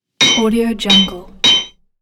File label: hammer on anvil